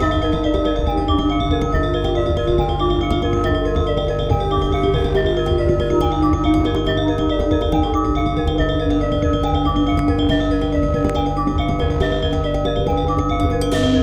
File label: Gamelan techno Slow; rive wreck hack die retry; ps4